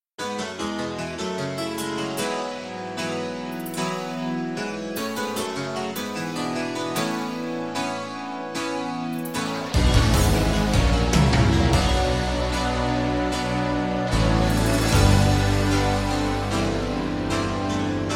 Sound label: Book of Our Lives Instrumental